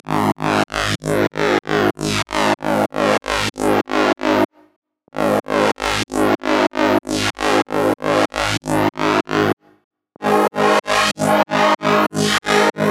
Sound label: Tek Filtered Mid Harmonic Lead